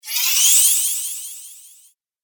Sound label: Fantasy Spell, magic sound effect; Role Playing Game; Andrew Fleming